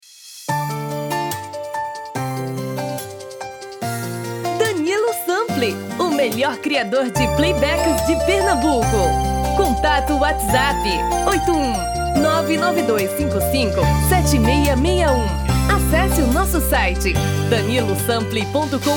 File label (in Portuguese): Brega